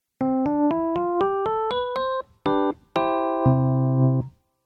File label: Rhodes LA Custom